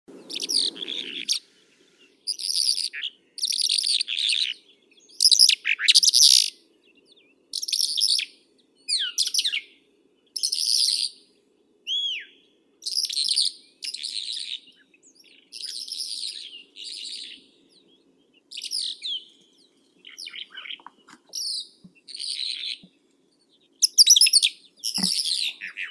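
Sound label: bimaculated lark, Melanocorypha bimaculata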